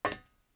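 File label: metal walk left, footsteps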